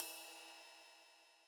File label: Yamaha DJX 2B, Ride Cymbal